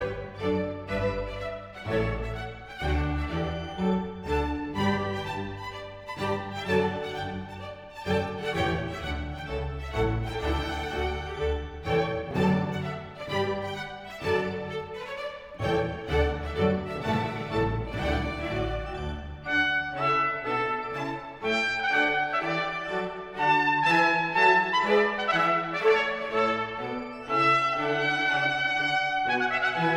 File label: Trumpet Voluntary; Stanley